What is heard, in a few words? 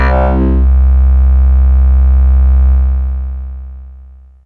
Growl Bass C3, Fairlight CMI IIe